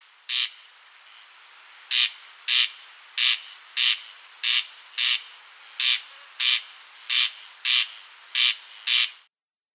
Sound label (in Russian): Korostel